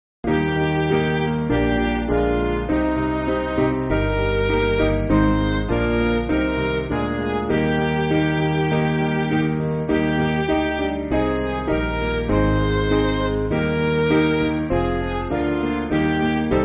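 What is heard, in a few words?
Midi, Backing Files for Churches; Abide With Me; Eventide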